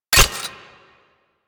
Revolver Rifle Low Ammo Warning; Warframe; Tenno

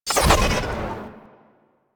Glaive Throw; Warframe; Weapons; Ghost Catcher

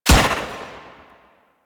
Fairy Weapon Shot; DE; Warframe

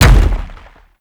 Biped Step, Enemies; Warframe; Man In The Wall

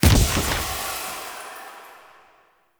Lantern Balloon Destroy; Objects; Ode Lantern Crate

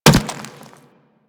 Infested Runner Step Default; Warframe

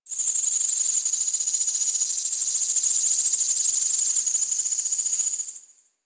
Rock Critter, Ambience; One Offs; Creatures; Warframe; Quests; The New War; Drifter Camp

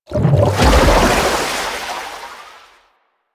Aqua Board Move Start Boost; Warframe; Yareli

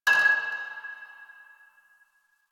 Pipe Cement Drop

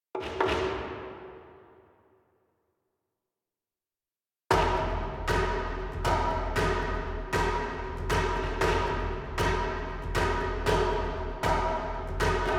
Hvy Blades Orch Perc; Warframe; Dynamic Music; Grineer; Grineer One

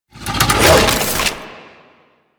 Sniper Reload Spin; DE; Warframe; Tenno; Quad Sniper

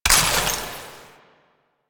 Ammo Pickup Gas; DE; Warframe; Warframes; Frumentarius; AmmoAbility

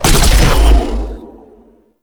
Race Gate Hit; Warframe; Tau; Circles Of Hell

